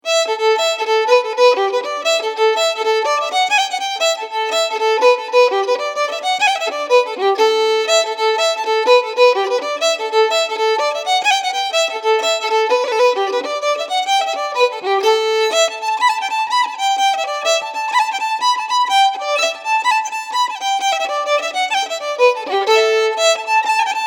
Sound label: Three Little Drummers